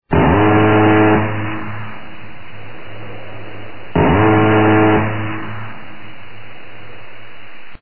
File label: minigun